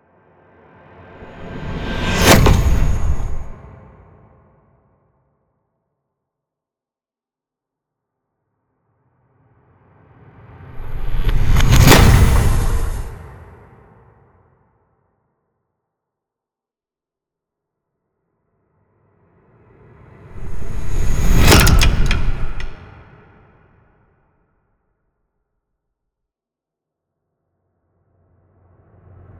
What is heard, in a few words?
heavy whoosh impacts; The Sound Pack Tree; The Whooshes & Impacts Pack